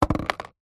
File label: household plastic disposable cup drop; zapsplat